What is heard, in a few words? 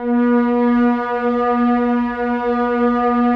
ATMO PADS; Best Service; XX Large